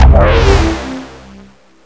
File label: cloak end